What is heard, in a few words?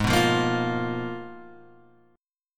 G sharp Minor 6th Add 9th G sharp Guitar Open G, chord